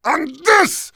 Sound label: Heavy meleeing